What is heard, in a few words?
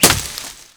foliage bullet impact; Rust